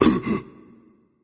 surprised; Smite; cabrakan

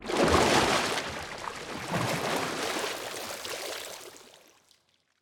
Victory Monster Circle; Susano; Smite

